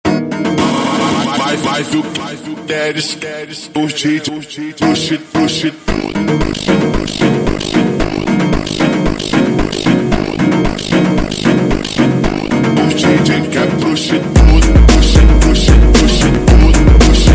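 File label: super Slowed, phonk music; funk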